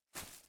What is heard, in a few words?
chair grass